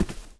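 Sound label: player wood scrape; svencoop; sence; mistake coop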